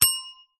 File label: bell small reception desk bell single ring